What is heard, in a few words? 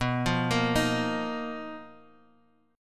B Major 7th Flat 5th B down, chord shape; BM7b5; arpeggiated; JGuitar